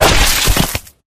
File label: flesh destroyed medium; flood